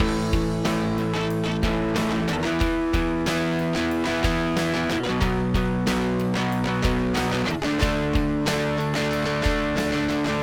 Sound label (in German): Donner Extrem Drive Wet; Gitarre